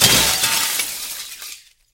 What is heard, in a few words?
broken glass fall; Golden Crayon Sounds